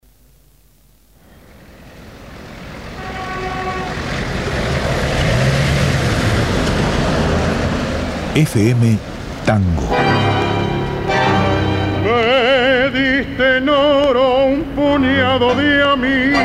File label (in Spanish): tango separador